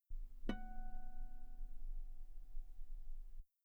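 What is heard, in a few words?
guitar F sharp 5 very long piano harmonics; bananenkeyboard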